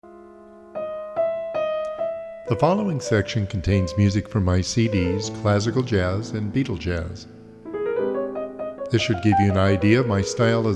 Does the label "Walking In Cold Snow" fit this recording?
No